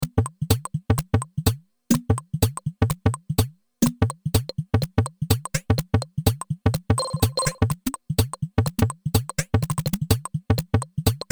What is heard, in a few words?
Blast Beats Percussion Drums Synth Voice Stutter; Twisted Electrons